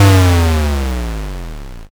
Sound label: explosion